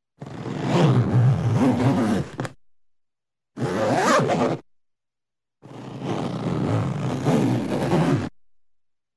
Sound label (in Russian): molniya zastezhka zastegivanie odezhdi